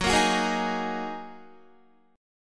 Gb 6th Add 9th Gb down Guitar

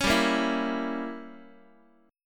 G Diminished 7th Guitar, Gdim7 chord; strummed; JGuitar

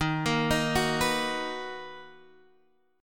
B Major 7th Eb

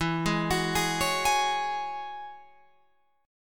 E 6th Add 9th E, Guitar Open F; arpeggiated

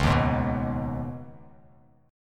Db 7th Suspended 2nd, Bass Standard; JGuitar

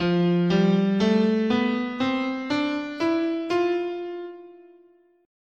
F Lydian, scale

